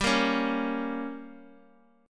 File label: G Diminished, Bass Standard; strummed; JGuitar